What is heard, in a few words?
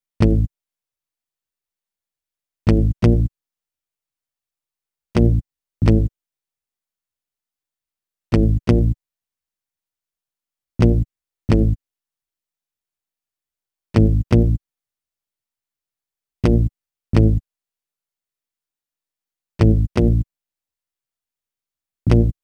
Bass, Hip-Hop; Sonic Foundry (Sony Creative Software); Lukecage Underground Soundlab Hip-Hop